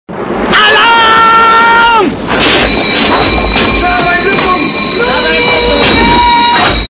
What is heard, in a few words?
Alarm; Das Boot